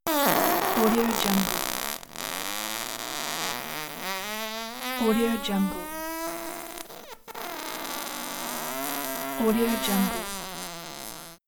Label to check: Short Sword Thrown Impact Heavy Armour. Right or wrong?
Wrong